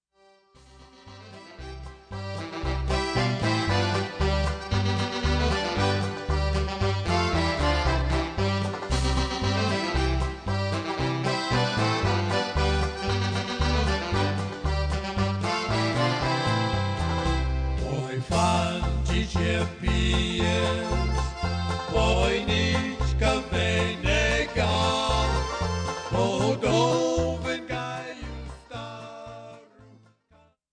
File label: Polka